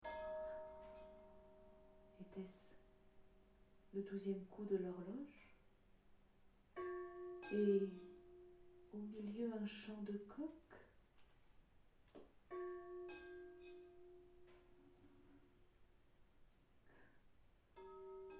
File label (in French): Cloches